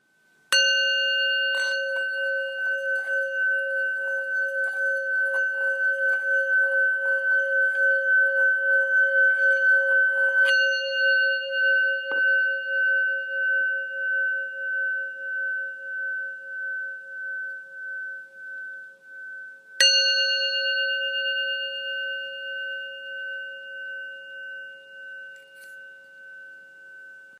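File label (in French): Bol bengale 3-400 sol, Bol chantant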